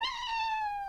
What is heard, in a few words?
hungry cat; صدای گربه